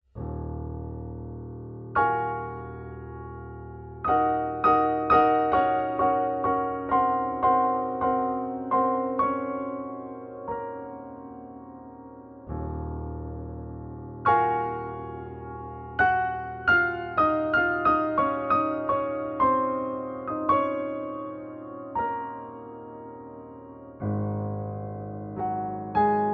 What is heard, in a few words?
Classical NY Steinway D Prelude